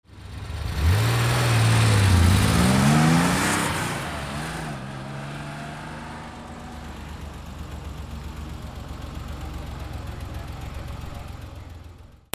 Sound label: CARS